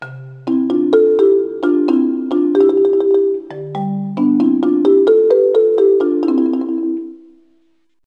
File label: Marimba; New Century Encyclopedia and Reference Collection II